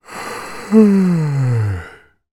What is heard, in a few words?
human male 37 years old yawn